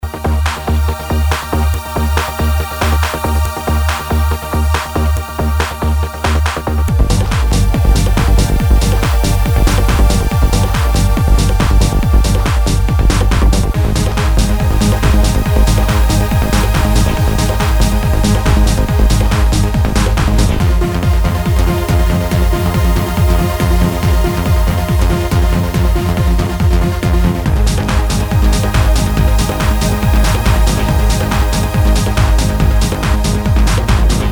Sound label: techno trans